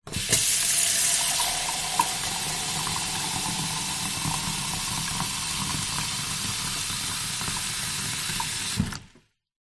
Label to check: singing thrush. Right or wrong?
Wrong